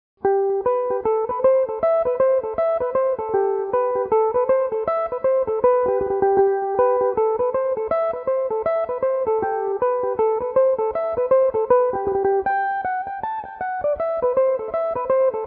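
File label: G major, mandolin; Gan Ainm 9; Reel (4/4); Belmuse EM-190 electric mandolin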